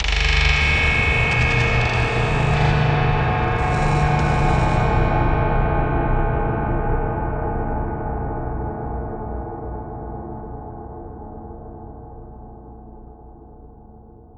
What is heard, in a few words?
Intense Loud Ambiance Jump Scare